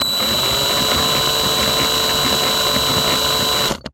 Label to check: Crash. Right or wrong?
Wrong